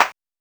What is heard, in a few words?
Clap